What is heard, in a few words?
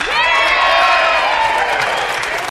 yeah applause